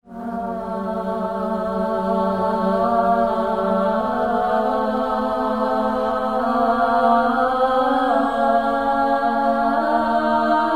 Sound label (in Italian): Voci Bulgare Esempio armonia; Ligeti; Cori Polifonici